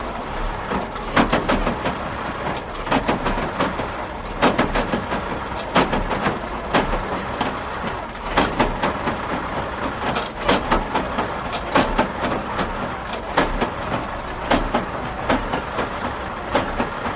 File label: Cement Mixer, cement truck